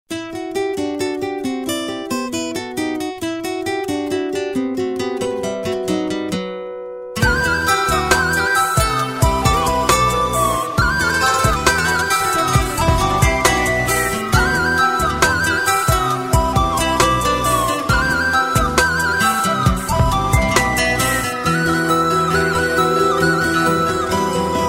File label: soft and sweet